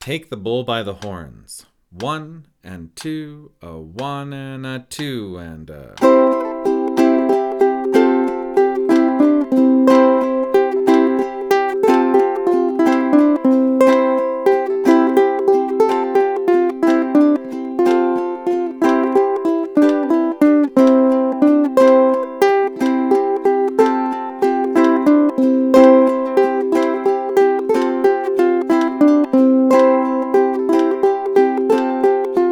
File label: Take the Bull by the Horns, ukulele